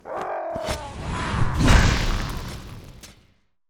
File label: servant gargoyle atk; monster